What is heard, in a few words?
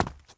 step run rock; FootStep